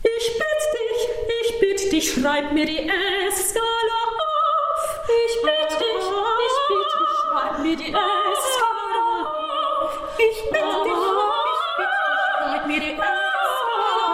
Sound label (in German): Dreistimmiger Kanon; Ich bitt dich schreib mir die Es Skala auf